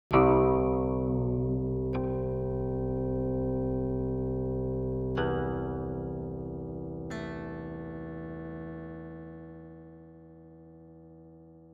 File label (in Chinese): 瑶琴独奏